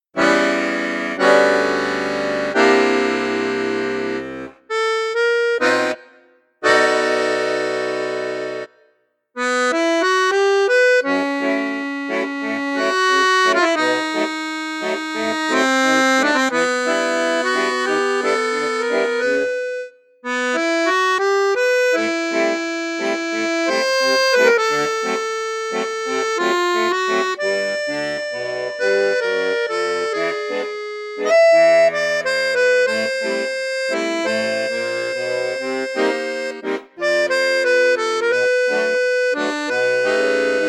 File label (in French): registre basson, accordeon; perle d or